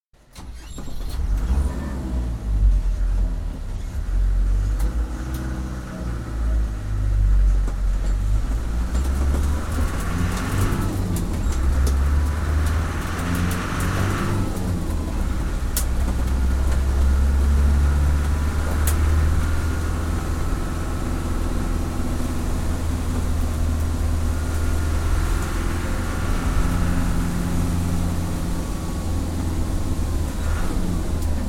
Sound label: Landrover, interior, switch on continuous run, switch off in mud; Cars; BBC